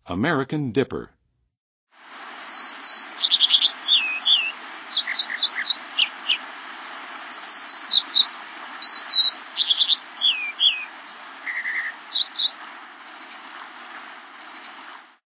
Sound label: American Dipper; North America; Western USA; Western USA Songbirds